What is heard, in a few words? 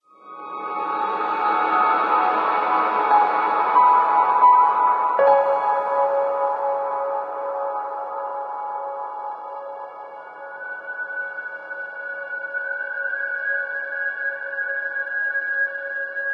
Village Sting; ether one redux gamerip 2015